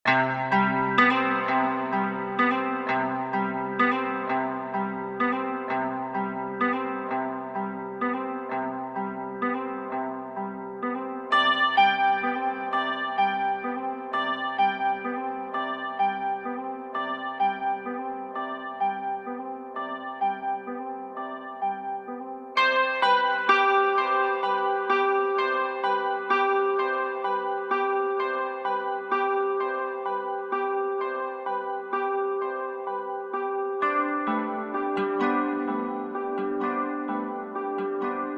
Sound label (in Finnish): kitara